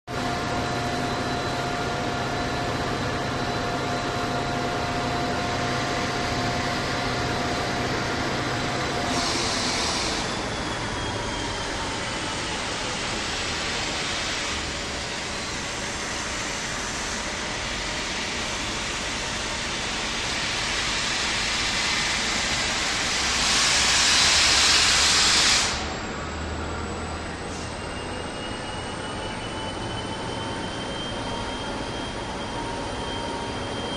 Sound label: Idle Diesel Train